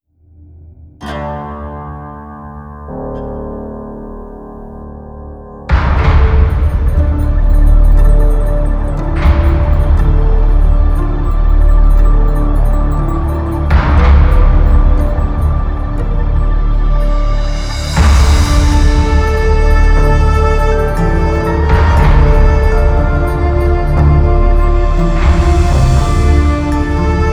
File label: Outro; Zombie Island